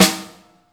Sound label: LIVE EQ SNARE; InVision Interactive; Lightware VOL-1